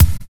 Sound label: Expand Kick; Sonic Foundry (Sony Creative Software); Drums & Drones; Construction Kits